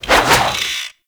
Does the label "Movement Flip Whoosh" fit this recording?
Yes